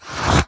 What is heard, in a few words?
cloth frou